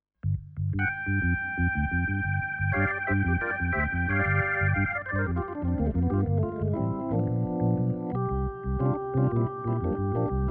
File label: Korg Pa5X Drawbar Organ